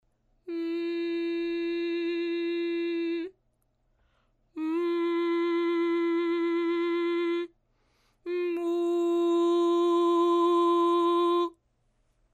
VOX Bocca Chiusa

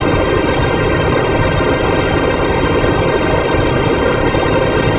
Rumore armonico

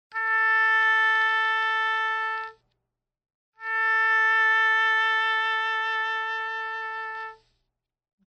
Vibrato

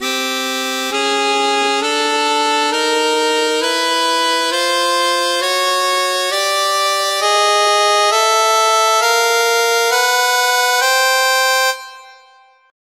Quinte pitagoriche

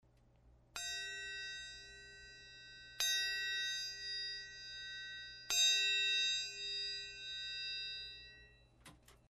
Triangolo Armonici

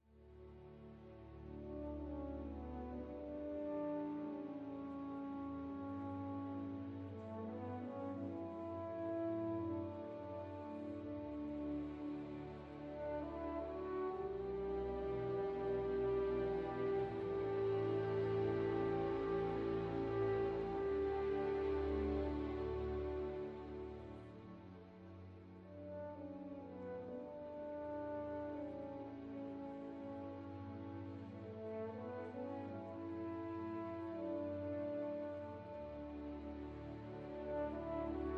Ciakovskij sinfonia 5 II

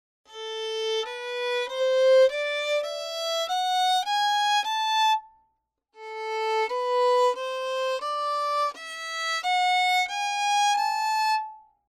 VL Nylon Budello

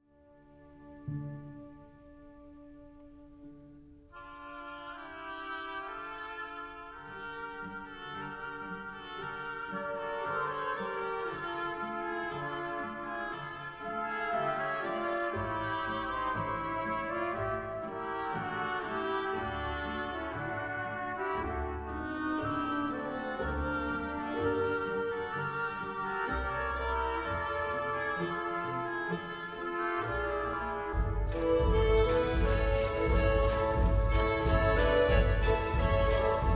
Mahler orchestrina

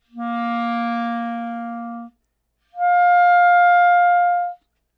CL Armonici

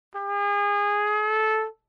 TR Glissato

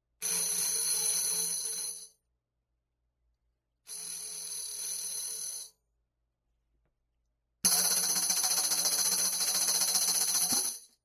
Tamburello Rulli

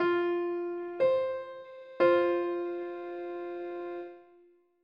Quinta giusta

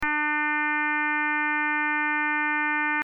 Suono armonico

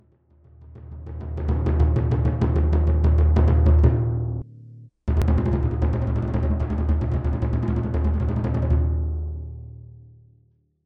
Rullo Timpano mezza

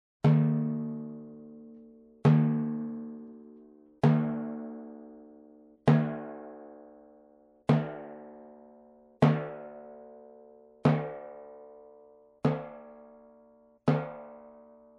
PC Armonici

